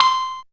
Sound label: synth, c, Top End Tinklers